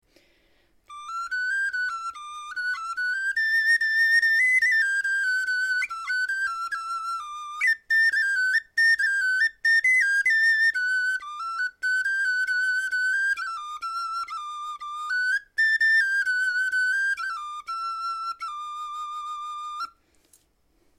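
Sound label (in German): melodie, Knochenflöten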